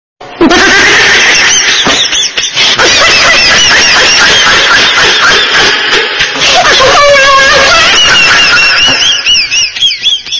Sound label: ahahahahha